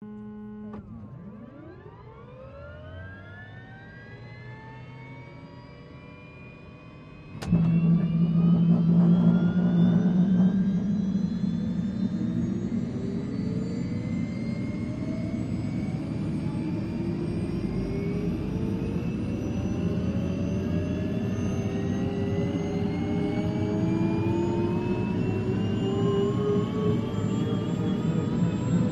Jet Ranger: Cockpit Interior Start-up, 2 Stage Turbine Power-up, Idle; Jet Ranger: Cockpit Interior Start-up, 2 Stage Turbine Power-up, Idle. Stereo